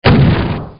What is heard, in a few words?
Shotgun; Doom